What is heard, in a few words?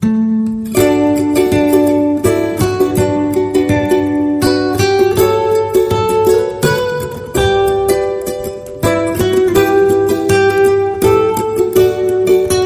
Away In a Manger Ukulele